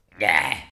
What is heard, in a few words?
argh; Infernal Radiation; baal